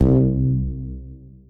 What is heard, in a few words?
cancel